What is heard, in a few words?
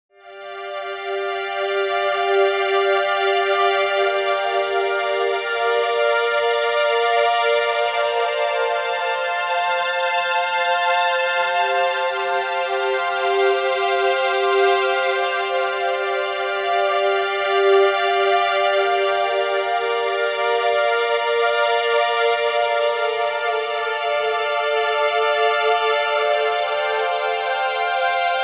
Synthwave Full; unisynth